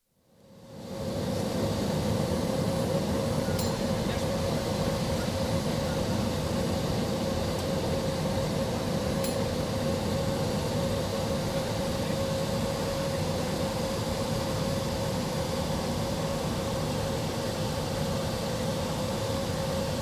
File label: Concorde flightdeck, land, taxi to standstill (Super-sonic jet airliner); Airplane Sound Effects; BBC